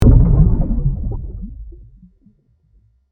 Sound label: large underwater explosion